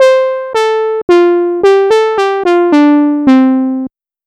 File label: Synth, Trip-Hop Loops; Sonic Foundry (Sony Creative Software) - Rondo Brothers Trip-Hop Loops & Samples; Apologists